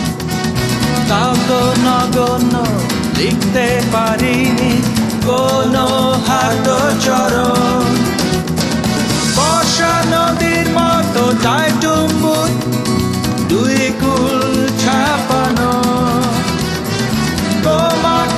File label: Bangla Hit Song